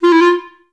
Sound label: F3, Advanced Orchestra; Best Service